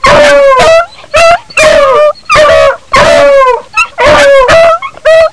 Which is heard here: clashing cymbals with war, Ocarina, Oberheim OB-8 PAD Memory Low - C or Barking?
Barking